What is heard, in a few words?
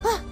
gasp; tails